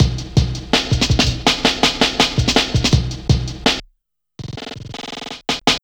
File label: 164 Insects, breaks; m8 breaks